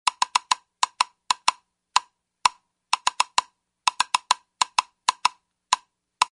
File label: wood blocks slow puppet dance